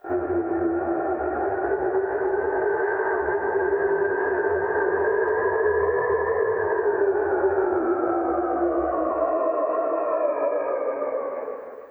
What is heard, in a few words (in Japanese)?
kimoi oto; 男系 霊 ７; 霊がいる空間 ヴォヴォヴォ ループ用バックサウンド